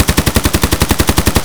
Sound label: aksu distant; loop; Escape from Tarkov